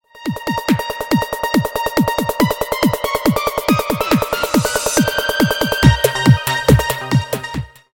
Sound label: electronic ringtone